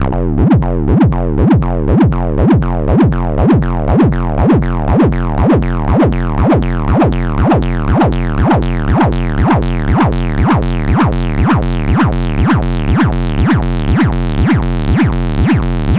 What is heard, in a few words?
Kick